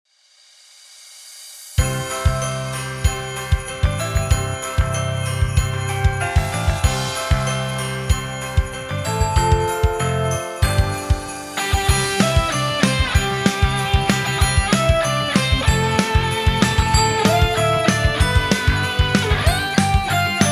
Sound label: energetic positive upbeat happy christmas party